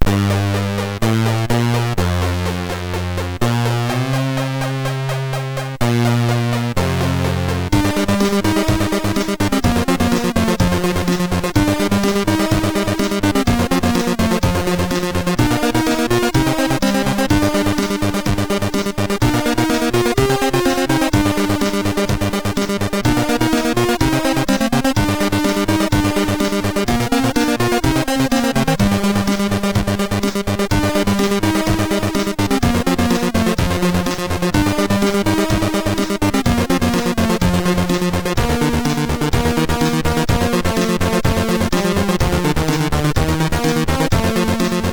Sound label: Mega Sound, sid MOS6581R3